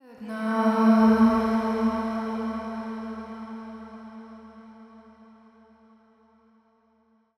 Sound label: 130bpm Am, Vocalpack